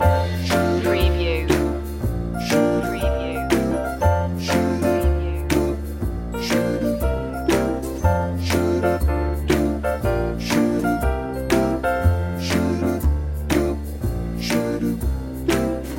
fresh and fun loop

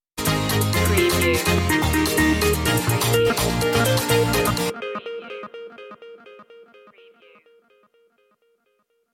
wet water stinger; media music now

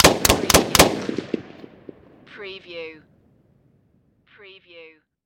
Machine Gun M16

